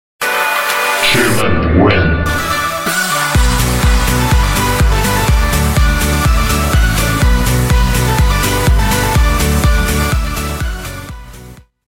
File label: escape success; valve